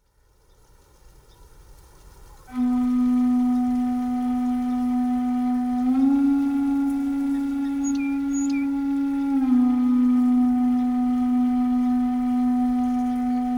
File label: nature sounds forest